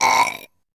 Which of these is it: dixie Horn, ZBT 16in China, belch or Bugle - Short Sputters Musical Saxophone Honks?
belch